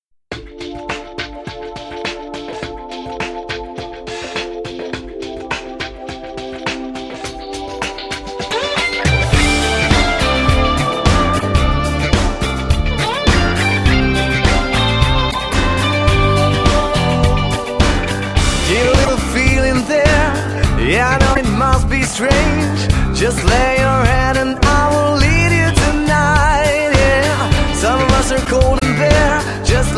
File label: disco